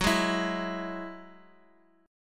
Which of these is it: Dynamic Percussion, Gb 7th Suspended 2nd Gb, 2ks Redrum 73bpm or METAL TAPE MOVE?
Gb 7th Suspended 2nd Gb